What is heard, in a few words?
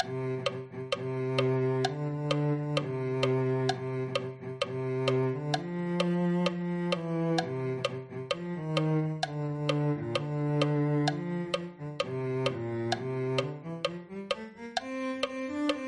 cello